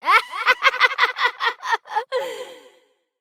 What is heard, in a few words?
Evil Twin Doppelganger Laughter; Battle Barks; Warframe; EntratiDoppelganger